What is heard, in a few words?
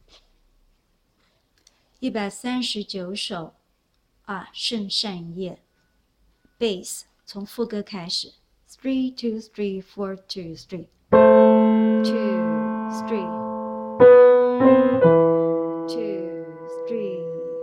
Bass